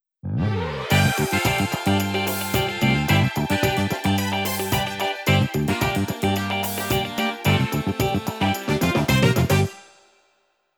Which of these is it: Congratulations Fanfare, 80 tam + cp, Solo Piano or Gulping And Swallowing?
Congratulations Fanfare